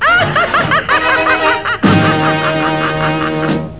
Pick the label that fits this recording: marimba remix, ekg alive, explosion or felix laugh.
felix laugh